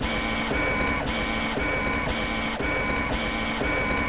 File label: legs run